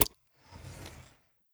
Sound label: bill show hide